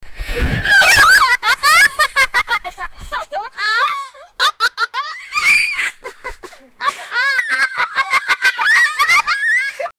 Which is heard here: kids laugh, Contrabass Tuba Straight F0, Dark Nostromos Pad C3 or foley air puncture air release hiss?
kids laugh